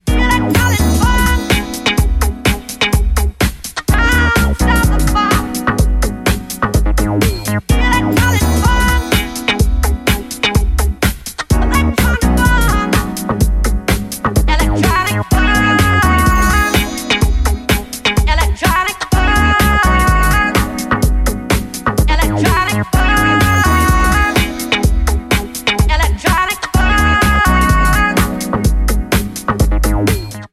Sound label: electronic funk